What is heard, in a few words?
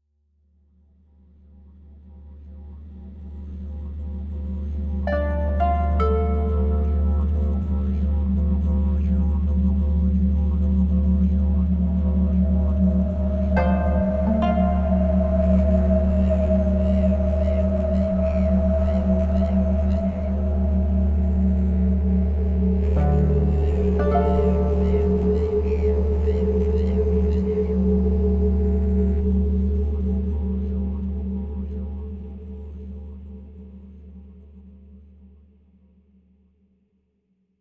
Transition; Ozymandias